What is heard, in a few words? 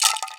RATTLE, INDIAN PERC